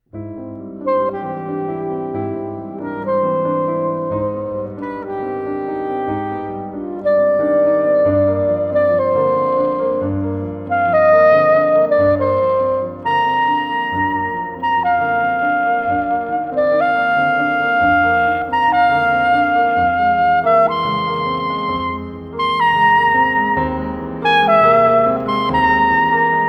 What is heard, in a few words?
Soprano Saxophone and Piano; Suite for Soprano Saxophone and Piano